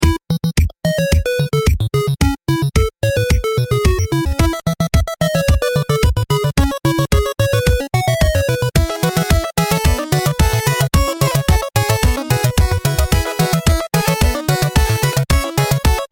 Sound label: arcade nostalgia